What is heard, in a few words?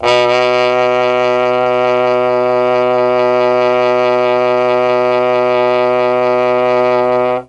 B1 TROMBONE, mellotron; CHMB TRMBONE